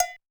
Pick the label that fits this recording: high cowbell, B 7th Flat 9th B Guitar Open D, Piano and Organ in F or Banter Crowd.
high cowbell